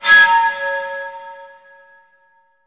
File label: FLOURISH; bolt